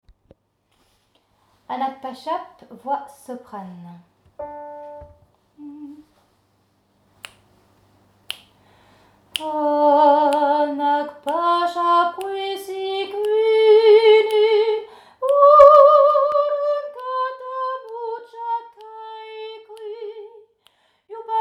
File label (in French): Voix soprane; Hanacpachap